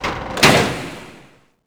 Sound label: vault stop, doors; ucsounds